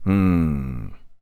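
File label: voice idle mmm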